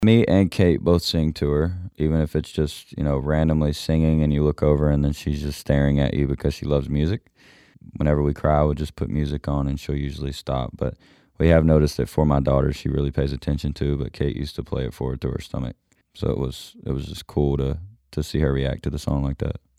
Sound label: Singing to Kingsley